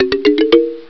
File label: ARROW